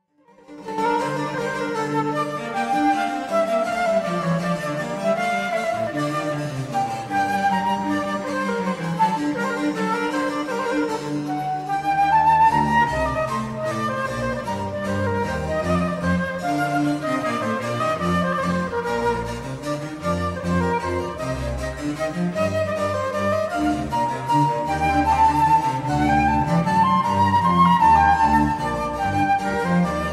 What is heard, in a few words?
Sonata In G Minor For Flute And B.C., Preludio; RV 56 From 'Pastor Fido'; Adagio